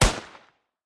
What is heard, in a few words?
shot